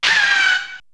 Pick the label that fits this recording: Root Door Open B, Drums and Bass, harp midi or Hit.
Hit